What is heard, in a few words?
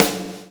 PULL, Backbeat; Spectrasonics - Backbeat